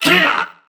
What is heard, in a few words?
Pain, Crewmen; Dialog; Barks; Battle; Warframe; CorpusCrewmen; CrewmanD